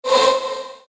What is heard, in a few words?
short movement sound; swooshes-swishes